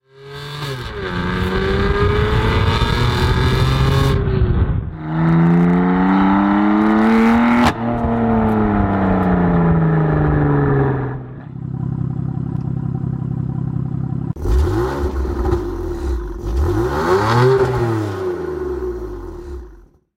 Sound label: BMW M4